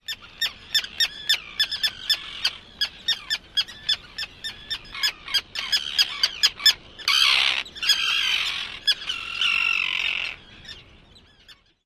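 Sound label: kip alarm calls, Common Tern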